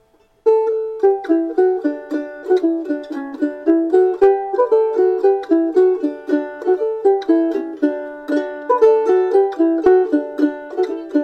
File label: polkas, Irish mandolin; The Tettegouche Polka Number 1/The Tettegouche Polka Number 2